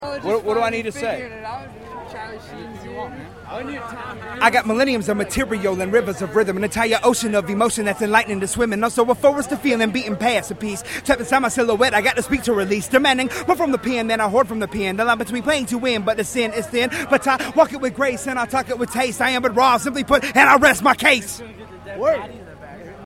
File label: juggalo rap